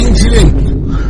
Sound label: wine boom sound